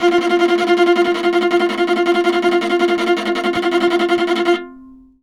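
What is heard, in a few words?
viola tremolo F3; Orchestral; DirectWave; Varazdin Orchestral; Viola II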